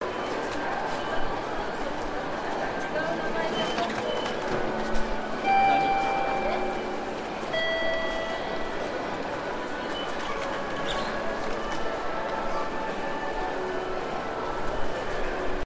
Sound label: examination